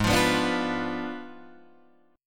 G sharp Diminished 7th G sharp, Guitar Open D

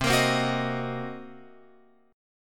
C Minor 7th Flat 5th Guitar Open F, chord; Cm7b5; strummed

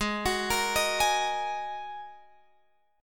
G sharp 7th Suspended 2nd, Guitar, Drop D; down; chord shape

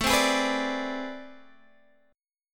A Minor Major 11th, down; AmM11; strummed

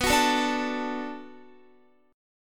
B Minor 7th B Guitar, chord; Bm7 strummed

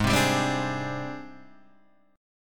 G sharp Major Sharp 11th, Guitar Standard; 4,3,5,5,3,4; strummed; capo=3

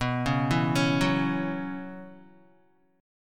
B Suspended 2nd Suspended 4th, Guitar; Bsus2sus4 arpeggiated

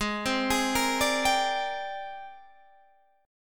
Ab Major Sharp 11th Ab down; AbM#11 arpeggiated

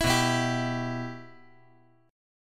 B Suspended 4th Sharp 5th B

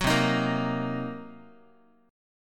C Minor 7th Flat 5th C down Guitar; strummed; 4A,4E,5A,5D,5G#,6C#; JGuitar

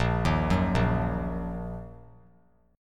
A sharp Suspended 4th Sharp 5th down; arpeggiated; A#sus4#5; JGuitar

